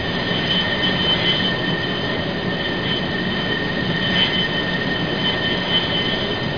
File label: fly